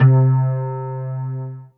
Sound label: ART OF BASS C3; Greytsounds; Scott Peers Sound Engineering